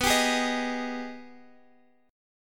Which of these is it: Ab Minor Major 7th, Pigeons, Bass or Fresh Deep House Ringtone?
Ab Minor Major 7th